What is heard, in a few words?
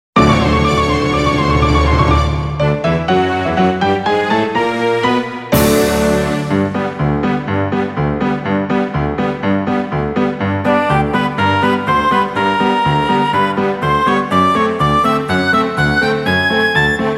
Happy Footsteps; Koibumi Romantica